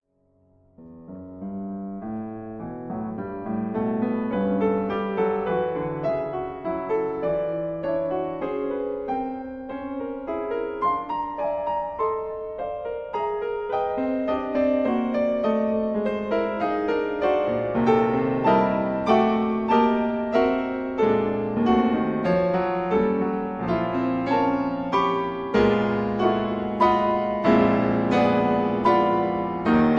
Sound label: Piano; Elegia Ravel